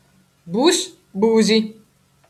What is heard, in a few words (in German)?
Bus; [buś, buźi]; 'buco', msg/pl; sibilante retratta postalveolare [ś, ź]; Veneto